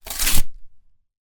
Cardboard Tear

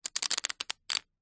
poker chips stack quickly on hard surface

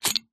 foley bottle lid twist open; zapsplat

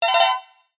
multimedia game sound short fast stuttering tone

